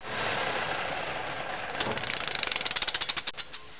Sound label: car off